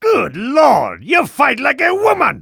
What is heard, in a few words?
fighting like a woman